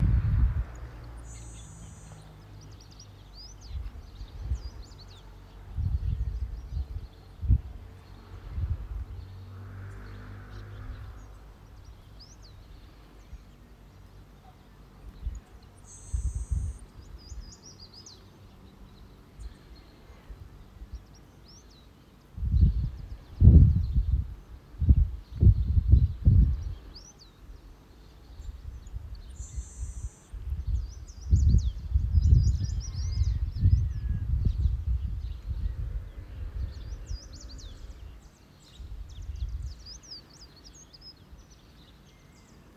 grasshopper sparrow

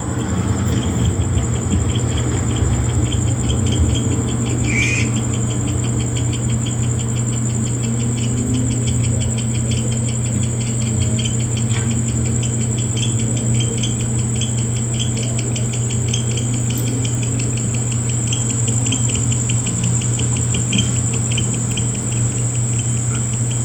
barn owls

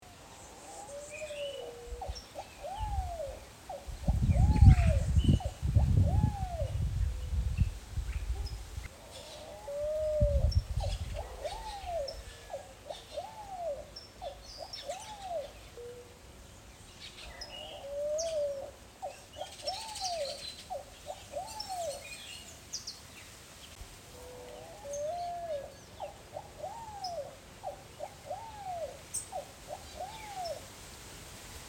bare eyed pigeon

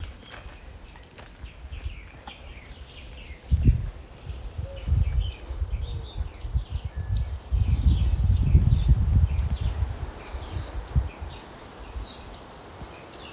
northern waterthrush

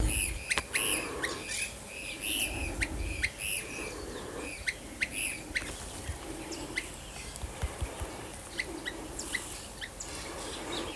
brown crested flycatcher

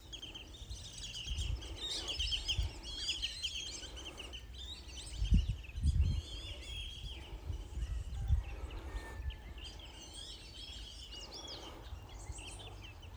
black bellied whistling duck

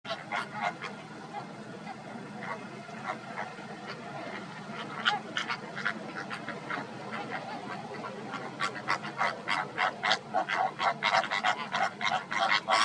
american flamingo

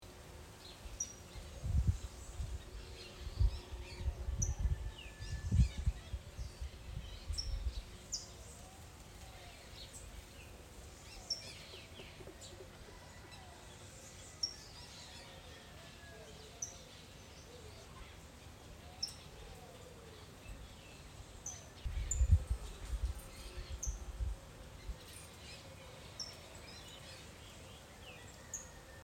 saffron finch call

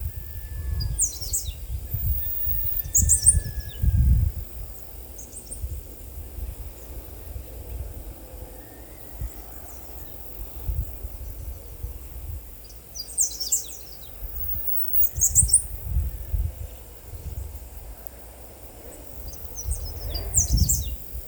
black faced grassquit

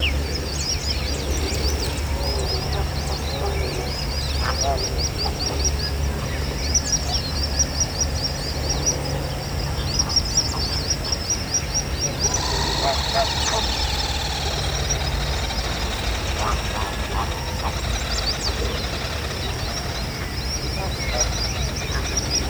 least grebe

